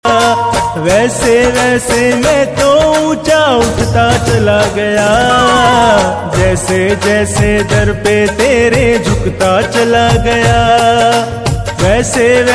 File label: Khatu Shyam Bhajan